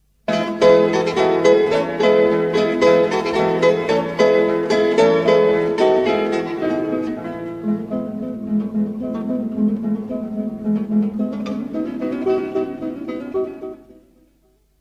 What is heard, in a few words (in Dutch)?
Akoestische gitaar; Vivaldi